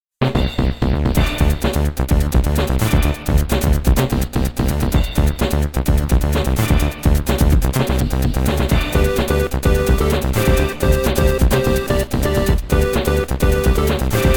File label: Music Menu; MKSC